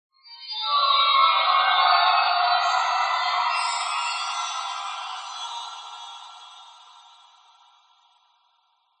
whistles pattern swirl complex general ascend magic cold; Skyclad Sound